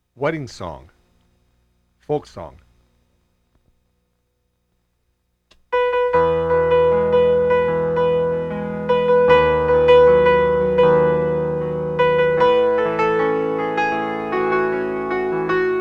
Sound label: Wedding Song Folk